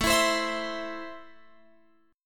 A Minor, Guitar, Open F; strummed

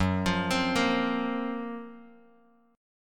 F sharp Major Sharp 11th, Bass Standard; 14,20,22,15; arpeggiated

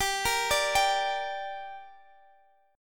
G Minor G, Guitar, DADGAD; chordshape; down

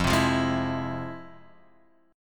F Minor Major 7th, F

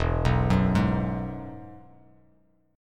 E Major Add 9th E down Bass Standard; arpeggiated; JGuitar

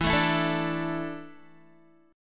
E 7th Suspended 4th, Guitar; E7sus4; strummed

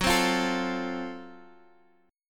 Gb Minor Major 7th Flat 5th, chord

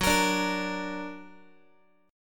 Gb Augmented Guitar; Listen to Gb+ strummed

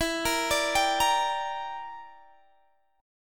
E Minor 7th Flat 5th, Guitar, DADGAD

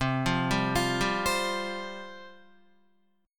C Suspended 4th C, Guitar; arpeggiated

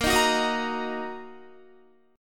Bb Suspended 4th Sharp 5th Bb Guitar; strummed; chordshape

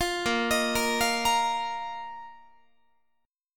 Bb Suspended 4th Bb down Guitar; arpeggiated; tuning 4A,4E,5A,5D,5G#,6C#

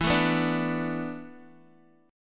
E 7th Suspended 4th, Guitar, DADGAD

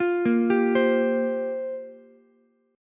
G Minor 11th G down Ukulele C6; Gm11 arpeggiated; JGuitar

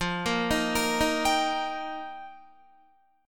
F 7th Suspended 2nd Suspended 4th, Guitar; F7sus2sus4; arpeggiated

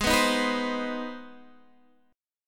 C Minor Major 7th Sharp 5th Ab

